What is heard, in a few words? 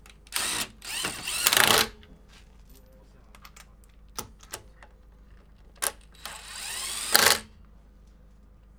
change tyre, Megane RS Sport car; Chupapsound